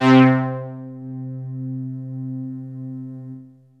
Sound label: Techno leads, hits and riffs